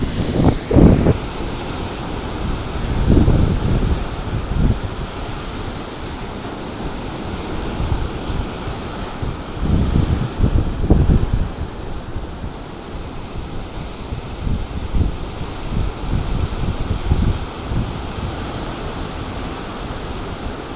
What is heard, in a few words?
ocean waves, colombo sri lanka